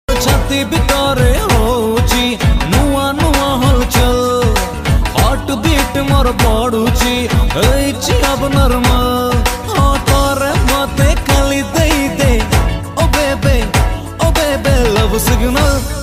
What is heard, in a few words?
Love Song Ringtone